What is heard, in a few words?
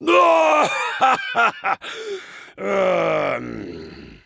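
laughter big, French; Rire gras 3; Heavy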